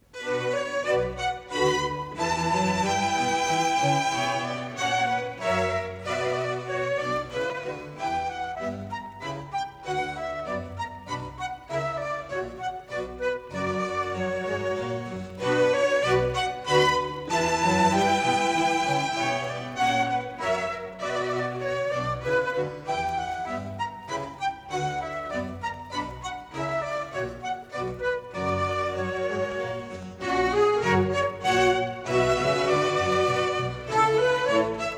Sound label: Orchestral Suite no. 2 in B minor BWV 1067 6. Menuett